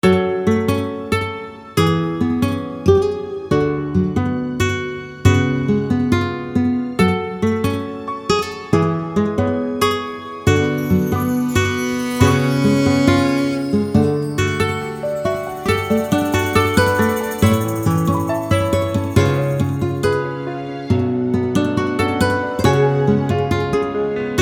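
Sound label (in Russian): guitar; gitara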